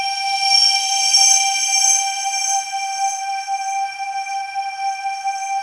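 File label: atmosphere; Sound Gallery - Charged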